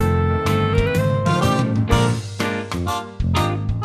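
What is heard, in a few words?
music instructional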